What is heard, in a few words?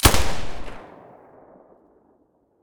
silencer galil stereo, weapons